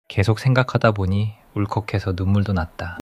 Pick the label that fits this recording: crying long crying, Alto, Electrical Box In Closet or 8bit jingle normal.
crying long crying